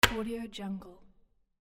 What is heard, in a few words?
put domino; soundfxpro